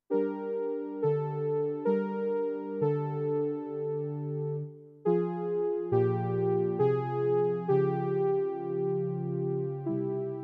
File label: Ana Vel Brass